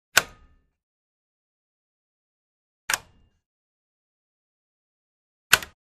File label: Keys Latch Lock X3